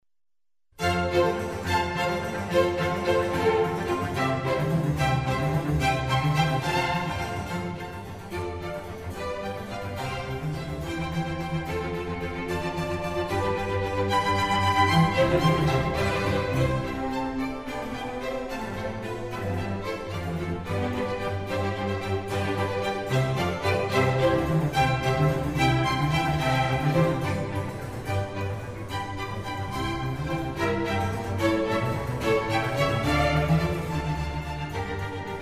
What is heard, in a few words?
Concerto for Strings in F major; RV136; Antonio Vivaldi